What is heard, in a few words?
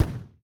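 explosion hit; AssaultCube; Alien Stars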